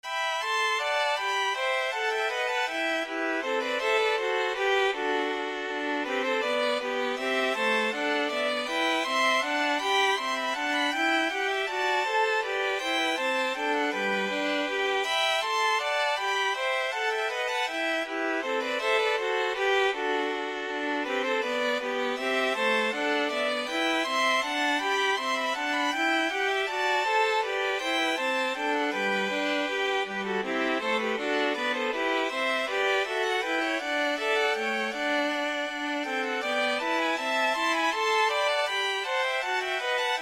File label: bourree, violin trio